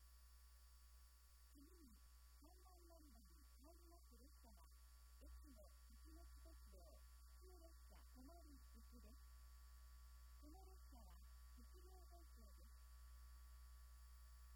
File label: local tomari, itoigawa station; echigo-tokimeki-railway